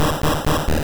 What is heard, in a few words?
Stomp; Pokemon; Gen 2; attack moves; GSC